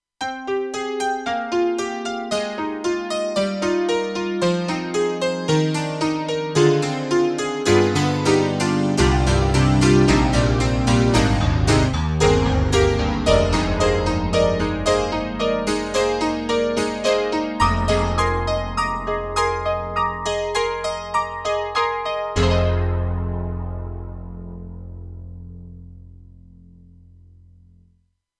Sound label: Roland JV-2080 Power Grand